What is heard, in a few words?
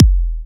Trance Kicks